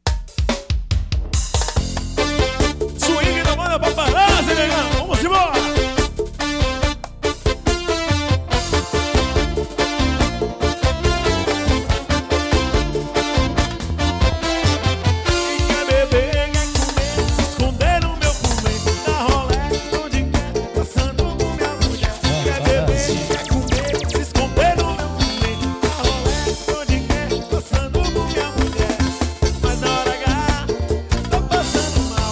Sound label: groove